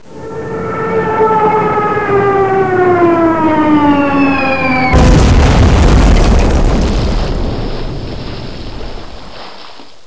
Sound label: stuka bomb; bergsee